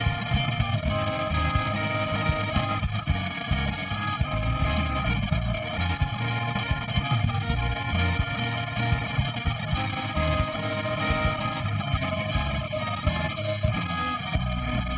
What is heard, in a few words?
mus